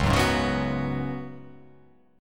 C sharp 7th Suspended 4th C sharp

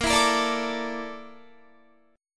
Bb Major 7th Flat 5th, Guitar; strummed

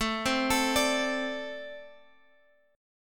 A Minor Double Flat 5th A, Guitar, Open G

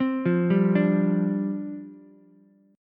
C Suspended 2nd Suspended 4th, down, Ukulele; Csus2sus4 arpeggiated; JGuitar

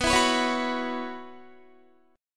B Suspended 2nd Suspended 4th, Guitar; strummed; 4E,4A,5D,5G,5C,6F; 19,19,21,18,18,18

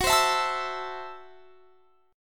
F sharp Minor 7th Flat 5th F sharp; strummed; x,21,22,21,22,24

